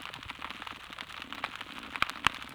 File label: weird, soniferous; Gilligans Island